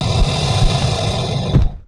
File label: WAR BEAST DIE